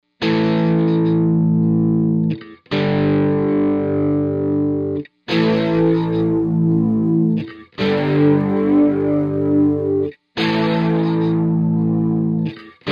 bass chorus; orinj

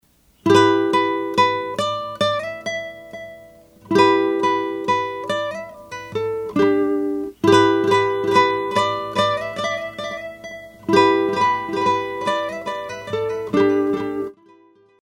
delay long; orinj

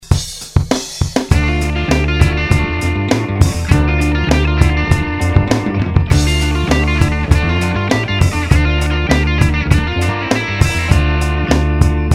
solo with delay bounce

